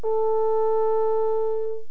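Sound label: french horn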